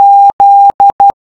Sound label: Morse Z